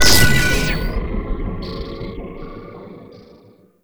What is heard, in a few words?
Crystal Shot; Echoes; Warframe; Entrati